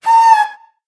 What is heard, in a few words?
affectionate scream, mob, ghast; Minecraft